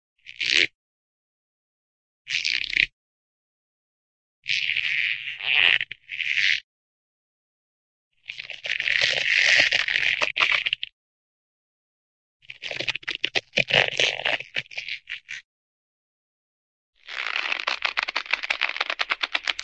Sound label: stretch, rope stretching rope creak, short; cartoon